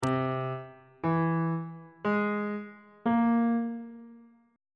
b sharp augmented 7th chord intervals on bass clef